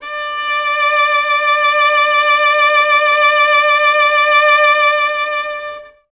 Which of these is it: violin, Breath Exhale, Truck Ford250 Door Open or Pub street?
violin